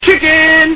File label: chicken; Siege+THF; taunts